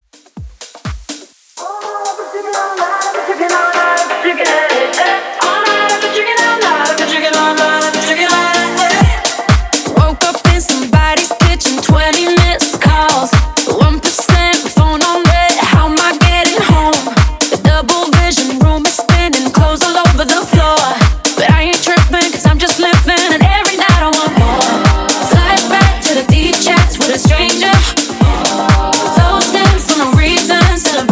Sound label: Club Dance 125 BPM; Redrum No Hype